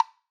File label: woodblock Single hit; Slate; SD SEISMIC Drum Sample Pack